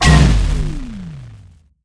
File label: Adrenaline Major Loss